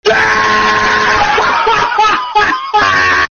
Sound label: cat laugh